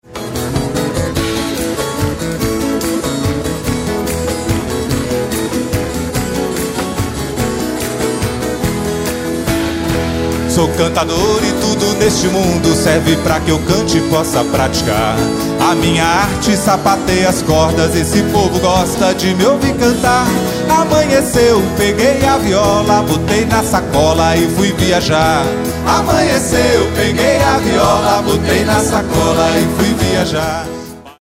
viola urbana

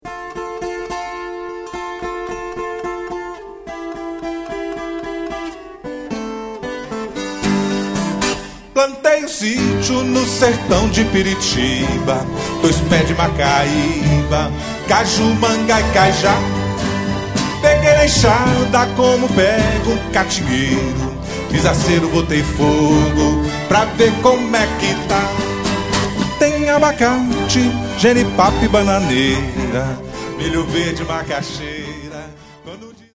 Viola Urbana

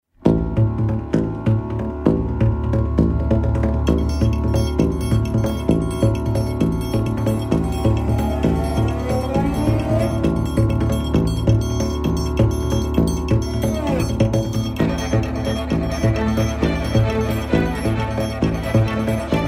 Forro

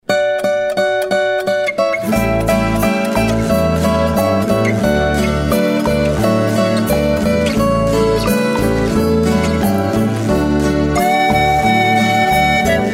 minha flauta

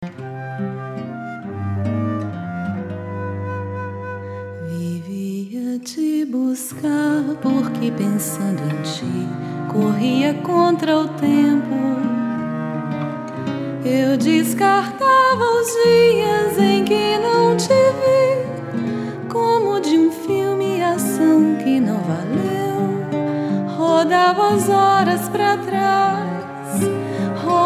valsa brasileira

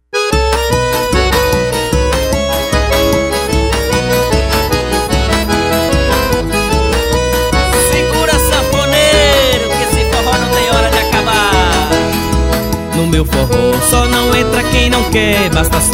forro

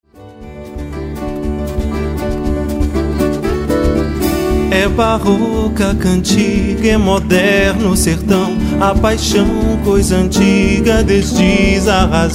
baiao barroco

